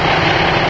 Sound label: truck; svencoop; st mission